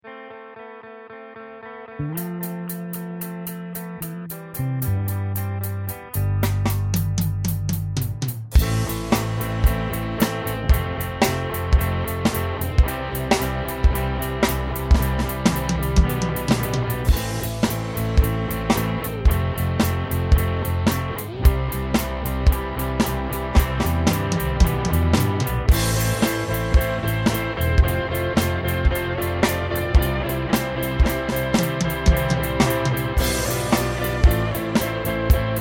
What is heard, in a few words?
4:4 Rock